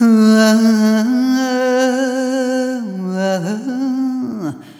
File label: Arrhythmic F